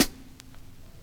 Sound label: vinyl hat